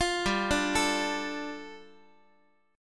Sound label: G 7th Suspended 2nd, Guitar; arpeggiated; JGuitar